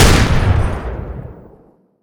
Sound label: Automatic Warrior, Weapons; DCS